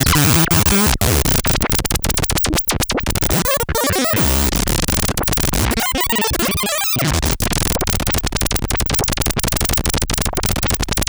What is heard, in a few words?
el blurps, special FX twisted